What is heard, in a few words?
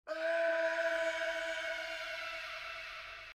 Screams